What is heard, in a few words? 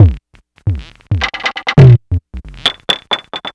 Drums Strange